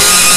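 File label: Classic Guitar F4; Nintendo; July 2020 Gigaleak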